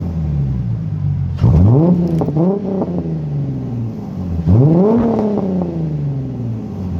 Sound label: V6 mustang revving